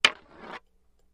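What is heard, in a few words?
Staff Hits Light Scrape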